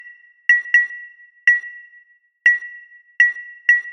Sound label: 122 BPM Glass Bottle; mmb drum arsenal vol 1